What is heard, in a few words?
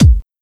Kick, drums; Sonic Foundry (Sony Creative Software); Drums & Drones; Construction Kits; Within Kit